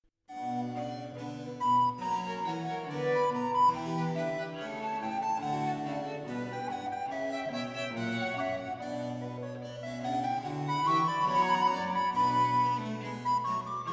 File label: scarlatti recorder concerto 12 mvt1 excerpt